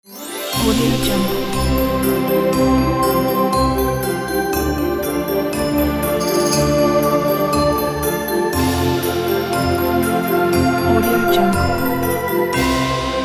christmas magic holiday logo intro

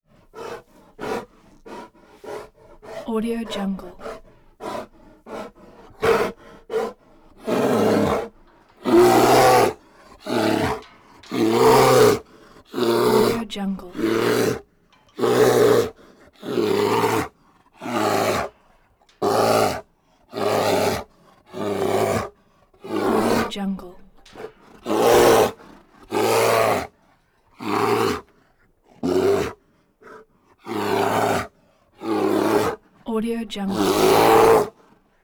kodiak bear roars cage rattles breathing

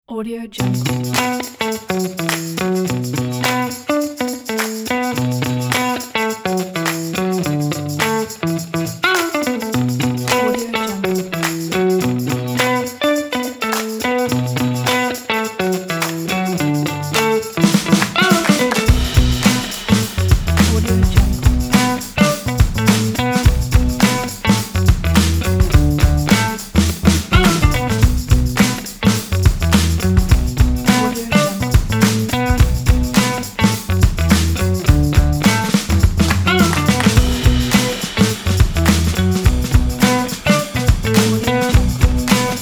the funk

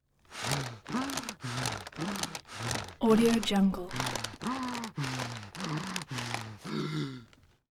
bag breath

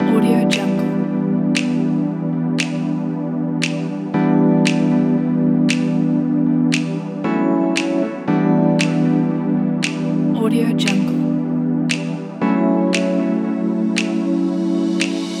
luxury boutique lounge

